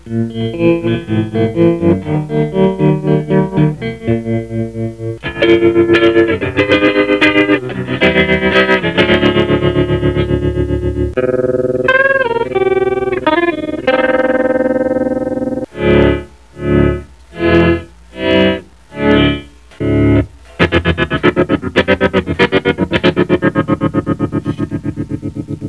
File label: tremulus